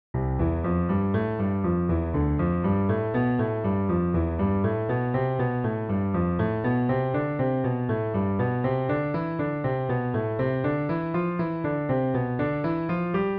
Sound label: hanon exercise, piano; Charles-Louis Hanon (1819–1900)